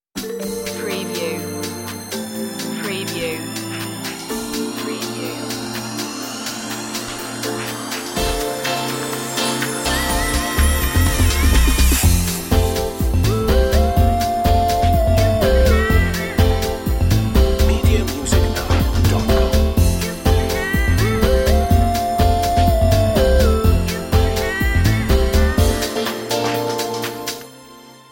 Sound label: vintage beats